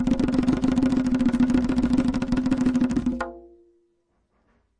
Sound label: arabic perc, Arabic Percussion Kit